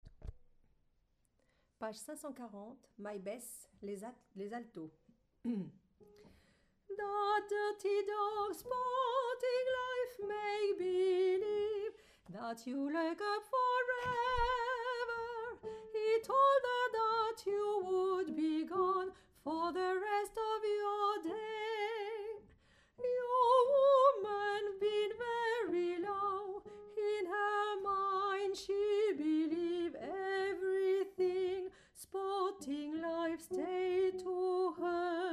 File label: Alto; Pour cet extrait, seules les femmes chantent. Les altos font la partie de Maria et les Sopranos celle de Serena.